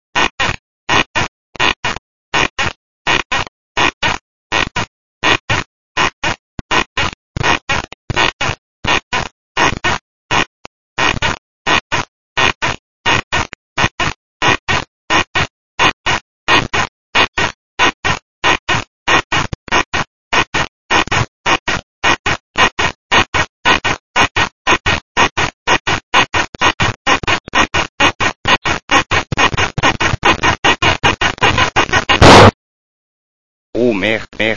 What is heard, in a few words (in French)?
battement lit